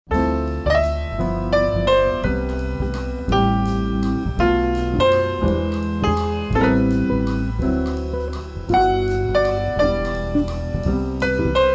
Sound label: light jazz